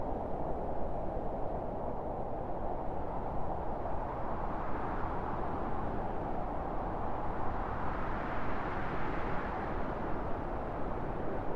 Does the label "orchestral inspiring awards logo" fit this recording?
No